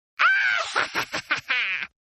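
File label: Laugh Witch; Laugh Male; Laughter; Evil Laughs; Horror; Laugh Male 2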